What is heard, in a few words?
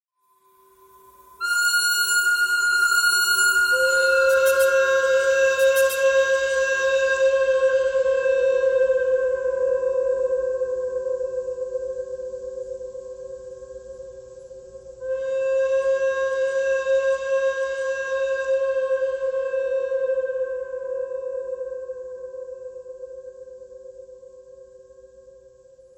bird cry bird cry thread simple